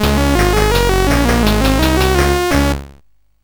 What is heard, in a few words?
Pipe Mania, CSG8580R5